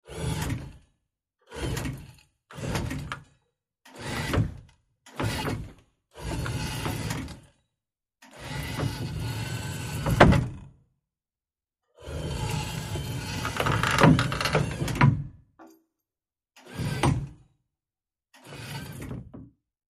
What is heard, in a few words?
Electric Motor; Lift 1; Larger Electric Motor; Sporadic Lurching Movements, Close Perspective.